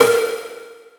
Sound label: glitch